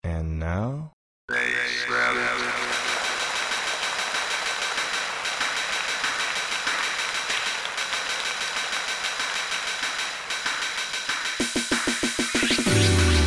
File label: Techno